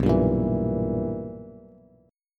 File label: B Major 7th Suspended 4th Sharp 5th Bass, chord; BM7sus4#5; strummed; 7,10,8,9